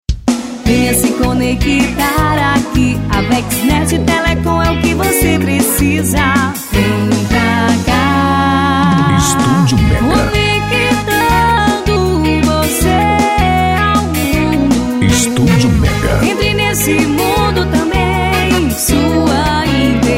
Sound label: JINGLE; VEX NET